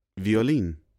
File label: musical instruments